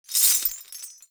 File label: Movement Dash Chains; Warframe; Uriel